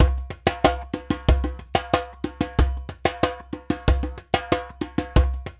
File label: percussion loop